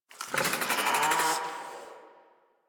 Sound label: Infested Charger Idle, Enemies; Warframe